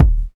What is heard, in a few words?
clear kick sample d sharp key; Duration 0:01; Samplerate 44100 Hz; kick drum